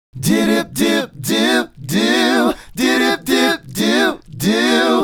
Male Group, Hip-Hop and RnB Vocals

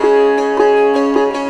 SITAR; Spectrasonics; Heart of Asia

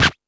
House Machine, Drum Machines

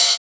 Closed Hat, JUNGLE BREAKS

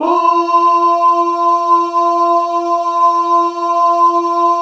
BAH F# 3, Synclavier; ILIO

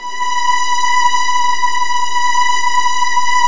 B3, Virtuoso Strings; ILIO; Kirk Hunter Virtuoso Strings VOL-1

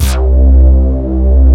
DRIVER SYN; Pro-Rec - Synthsonic

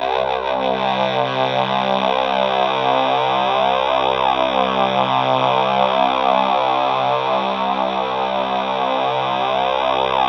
C2, Synth Leads

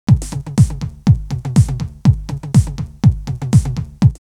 909 Toms 122, drumloops, DrumMachines; X-Static Goldmine; 90 s Sample CDs

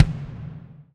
BD, BD REAL

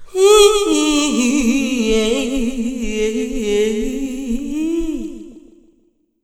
IHIHIYEAHI, Funky Vocals; USB Soundscan vol.18

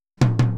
Boogaloo Fill Tom Rack, 82 BPM, Drums; Sonic Foundry (Sony Creative Software) - Drums from the Big Room The Mixes

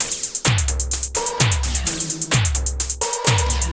Drum Loops

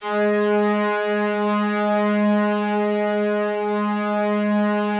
G#2, STRINGS, Yamaha VP-1; Music Store; JODYSTRINGS

